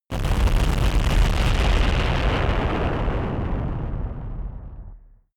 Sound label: massive blast strike